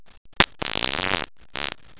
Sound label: fart